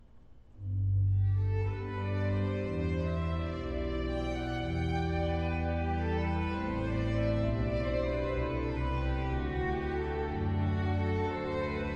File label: violin trumpet; weddings; PROCESSIONALS; Jesu Joy of Mans Desiring